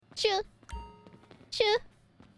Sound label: chu chu